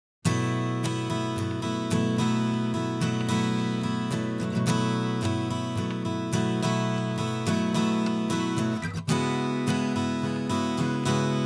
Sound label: Dry Acoustic